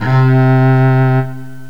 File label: viola; Strings & Orchestra; GMPlay